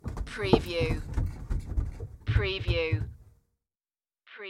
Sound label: Footsteps on Wooden Stairs; people's footsteps; human sounds